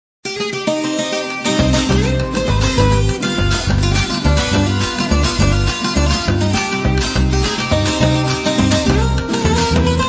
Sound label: bambuco